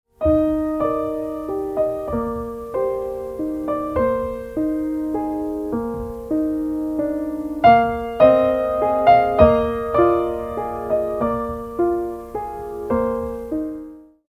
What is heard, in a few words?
ragtime